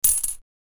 clink coins dropped on top of each other

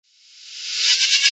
evil laughter reverse real; Halloween

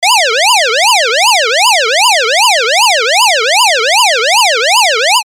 siren emergency yelp

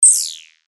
lazer

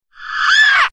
scream reverse real; Halloween

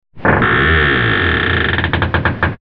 creak hinge; Halloween

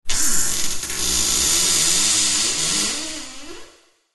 creak hinge real; Halloween

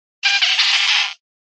evil laughter real; Halloween

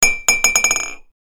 clink glass rocking against ceramic tiles real

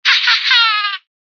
evil laughter real; Halloween

